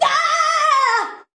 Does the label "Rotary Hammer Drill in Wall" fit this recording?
No